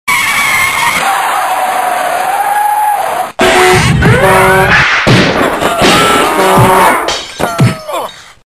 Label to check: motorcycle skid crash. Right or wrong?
Right